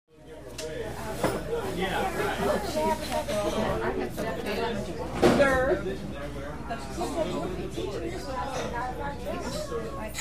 Restaurant Walla; Small Coffee Shop Medium Busy With Two Close Women Walla, Laughs, And General Male Walla Medium. Silverware And Dish Movement Close To Distant.